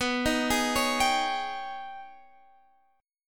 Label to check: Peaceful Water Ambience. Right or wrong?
Wrong